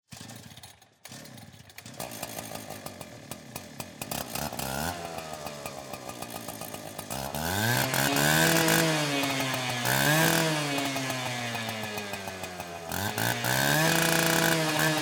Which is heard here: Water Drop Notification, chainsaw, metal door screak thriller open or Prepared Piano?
chainsaw